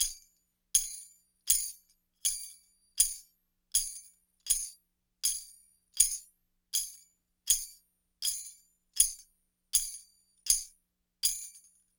Wooden Jingle Stick, East Indian; Sonic Foundry (Sony Creative Software); Continental Drift Aftershocks

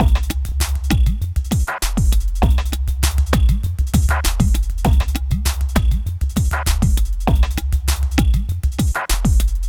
Ethnotronic, Processed Percussion

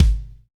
Reg. Kick; Roland BK-5

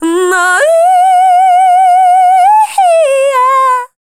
FEMALE, Dance Vocals

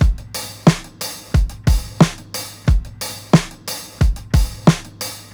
THICK OFF BEAT HATS, breaks, drums; Basement drums vol 2

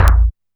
Kick, Alesis DM5

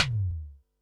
tom, Pearl SC-40 Toms

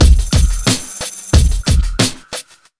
90 BEAT, Pure Electro; USB Soundscan vol.03; AKAI

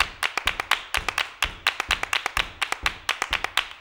CLAP + STEP, MCLAPS+STEPS; Best Service; World Colours

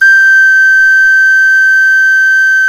CLAYFLUTE, Heart of Africa

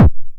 909 BD Tape Sat

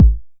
909 BD; Goldbaby Tape808 & Tape909; The Tape 909

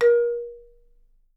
A#3, Percussion & Harp; Miroslav Vitous - Percussion & Harp

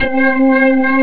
organ; jazz jackrabbit 2; M8

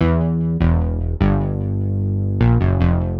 Chase Bass 75-E, horrorscape samples 75bpm

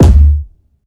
big ass kick

